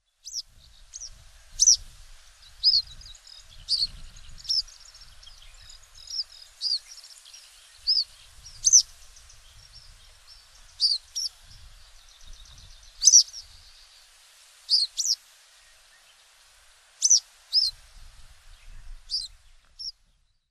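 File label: eastern yellow wagtail, hokkaido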